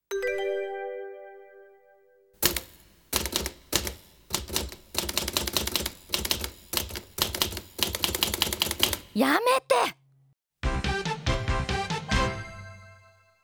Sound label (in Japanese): タイプライター; 耳物語; タイプライターやめて